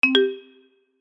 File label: new message